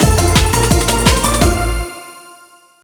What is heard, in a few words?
Candle Blow End Tag; Birthday Party Bash; Wii; Spanish